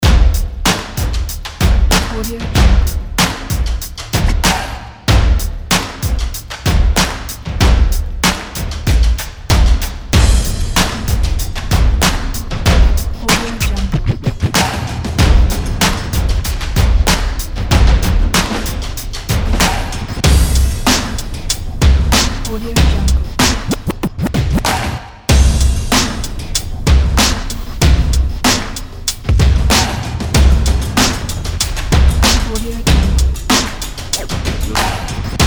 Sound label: hiphop